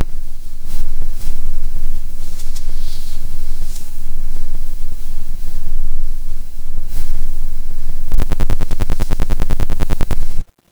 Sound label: ambience forest